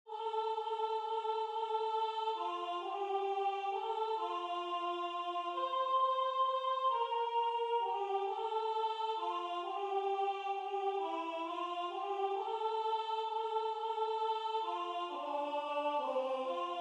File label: Soprano